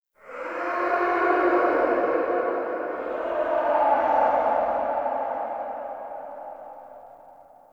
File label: Interior Texture Aggression Calls, Infested, Ambience; Misc Indoor